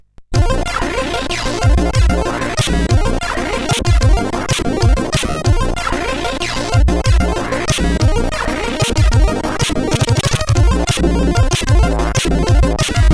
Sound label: Splashing Waves, sid CSG8580R5